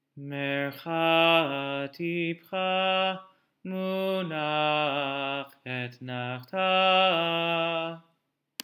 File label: Torah Trop Pattern